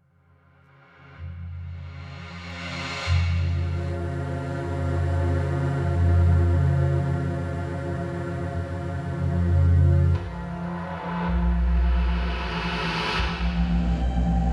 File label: Soundscapes